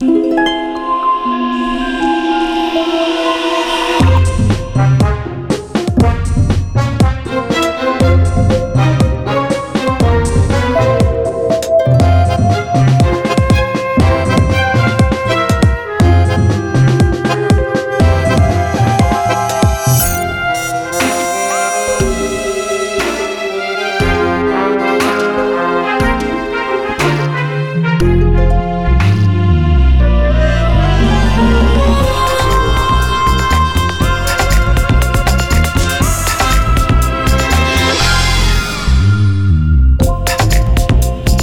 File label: Commercial electronic pop tango